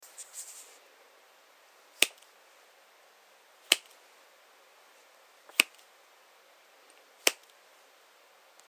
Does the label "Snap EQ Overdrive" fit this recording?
Yes